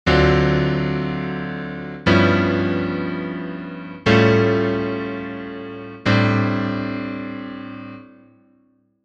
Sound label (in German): komplexere jazzakkorde piano